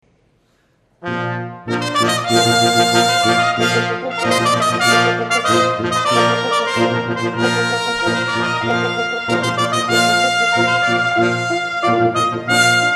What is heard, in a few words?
Fanfare; Monteverdi